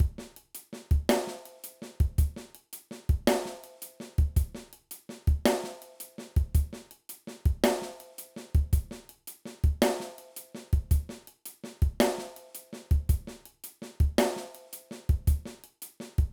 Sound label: Purdie Shuffle